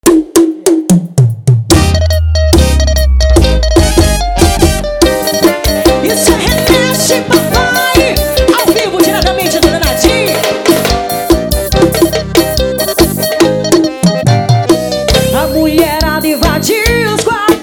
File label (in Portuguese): pianinho, forró